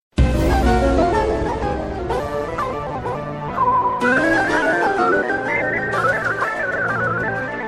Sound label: distorted illuminati